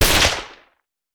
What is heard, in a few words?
Hand Gun; Sound Ideas; Guns